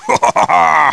cackle; GBA; MLSS; Bowser

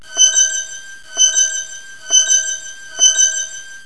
Futuristic Sound; PC; MARIOTEACHESTYPING2

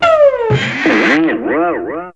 Cartoonish Sound; PC; MARIOTEACHESTYPING2

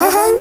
ahem; DS; ML BOWSERS INSIDE; Fawful

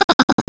laugh; GBA; MLSS; E.Gadd

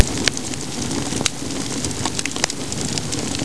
Sorta Firey Sound; PC; MARIOTEACHESTYPING2

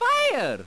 fire; GBA; MLSS; mario

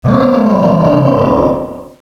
huuuuuugh, Echo (inside caves, underground ect.); DS; ML BOWSERS INSIDE

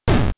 drop; SNES; SMRPG

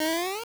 jump; GBA; MLSS

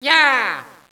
yahhh, echo inside caves, underground; DS; ML BOWSERS INSIDE; Luigi

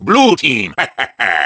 hehehe; wario; blue team; WII; MARIOKARTWII

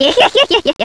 cackle; GBA; MLSS; Cackletta

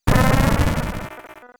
door; bowser keep; SNES; SMRPG

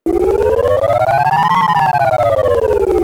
vine grow; SNES; SMRPG

